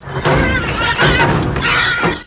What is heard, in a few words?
Hit